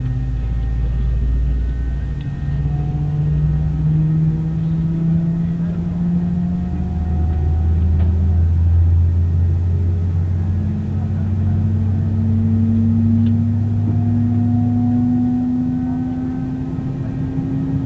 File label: start, CFM56B, wingfwd; A320